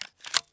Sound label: mr133 shell in mag; Escape from Tarkov